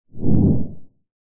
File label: industrial wind turbine blade swoosh slow heavy; zapsplat